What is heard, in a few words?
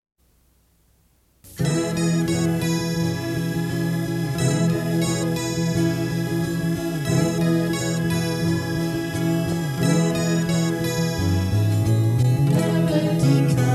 Melody